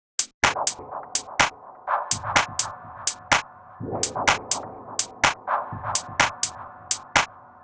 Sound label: percussion loop 125